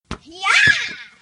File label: yaaah